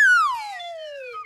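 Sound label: WHISTLE, LATIN PERCUSSION; Best Service Advanced Media Trax Modern Composer; LATIN PC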